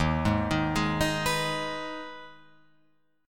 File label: Eb Suspended 4th Sharp 5th Eb Guitar Open F; Ebsus4#5 arpeggiated; JGuitar